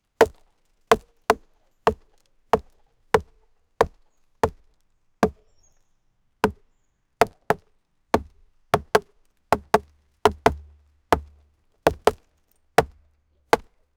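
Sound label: buffalo hooves hard impact; SFX Engine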